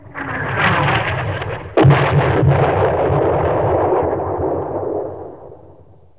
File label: explosion; CD Action 28b; east front